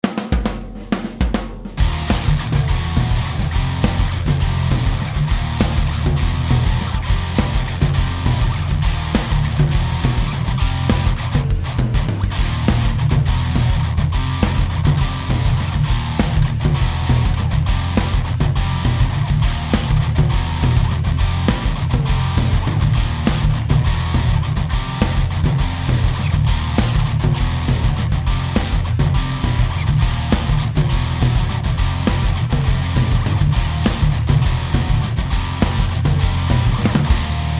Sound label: progressive